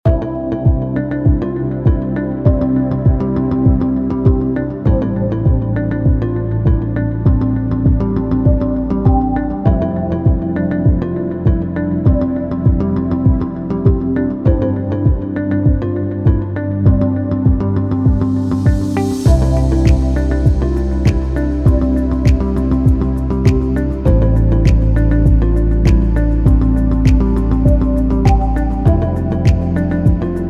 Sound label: Chilled Ambient